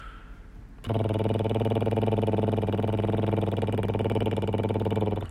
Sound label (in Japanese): リップロール